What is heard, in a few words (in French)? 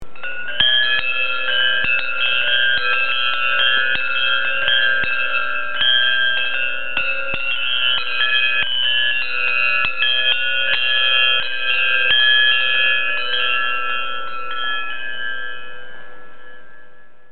Chapelle tintement